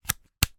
technology camera digital memory card slot cap close